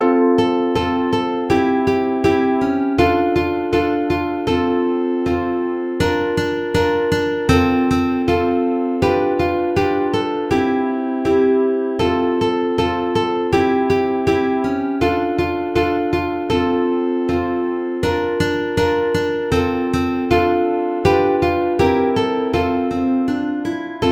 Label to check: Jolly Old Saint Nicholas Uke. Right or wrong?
Right